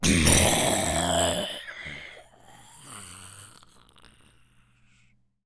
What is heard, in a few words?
Death, Zombies; zps; Carrier